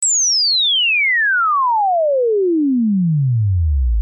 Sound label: REVERSO Sine 50-8000 hz 0dB sine